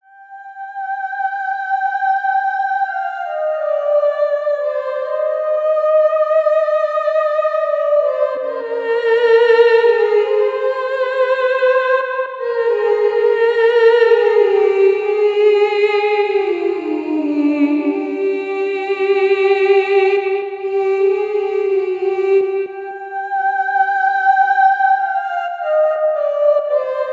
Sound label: soprano eh oh ohs